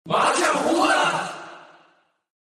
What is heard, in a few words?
free spin get